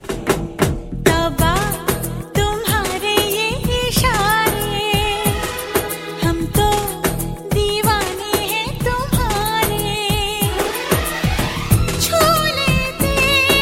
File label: female voice, hindi; ringtone; chalte chalte 2003 hindi movie